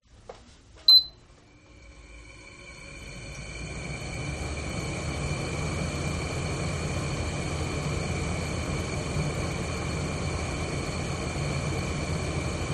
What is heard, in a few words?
Old Air Condition, USA Orange County